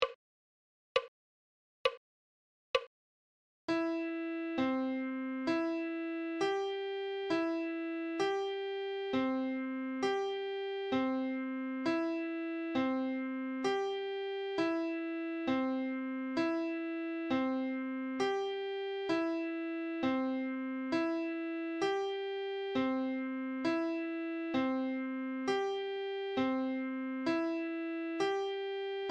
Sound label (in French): clé de sol adagio